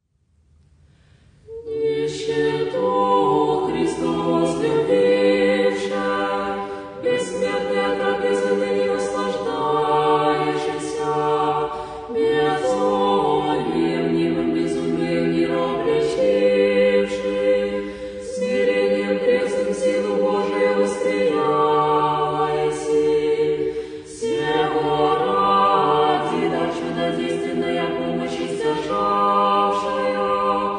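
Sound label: Kliros choir Velichanie - Troparion and kontakion; svyatoj blazhennoj Ksenii Peterburgskoj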